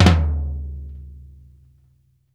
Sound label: FLAM FLOOR, TOMS; Sampleheads; New York City Drumworks VOL-1; SP TOMS